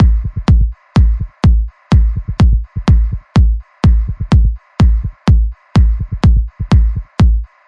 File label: hard kiks and noise pan, 125 bpm